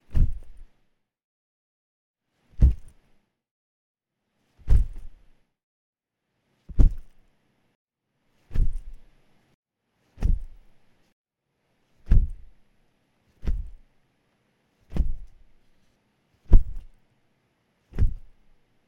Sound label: Fist Miss